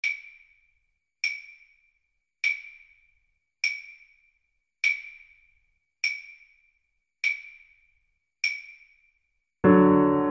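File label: viola karaoke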